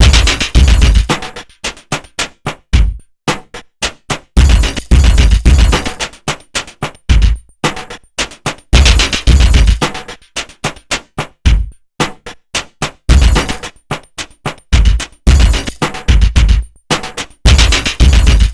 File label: TRIPHOP, GRV110; INIS; Funky Xtreams